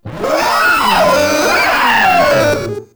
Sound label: Proto Infested Runner Vocal Attack; Warframe